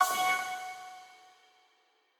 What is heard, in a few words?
Quasar Clear B